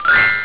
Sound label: Magic; C Net pager